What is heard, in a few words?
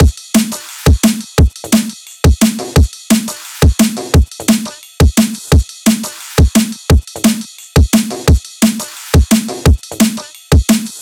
174 drums full